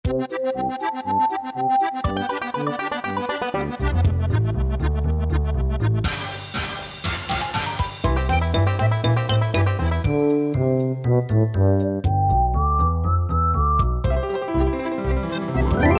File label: bgm